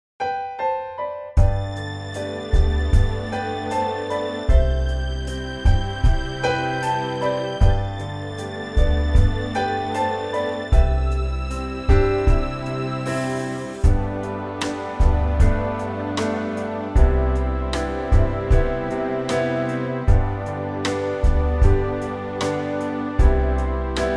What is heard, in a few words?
G orchestra